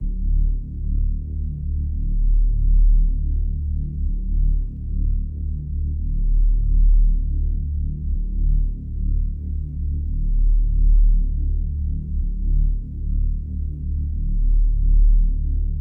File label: bass, 118 BPM D Min; Cymatics; Ares